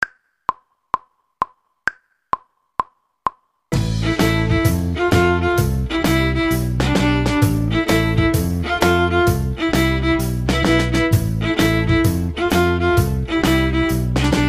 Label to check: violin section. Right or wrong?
Right